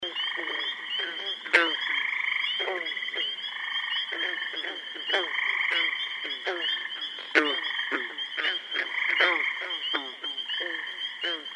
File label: May Wetland, frogs in chorus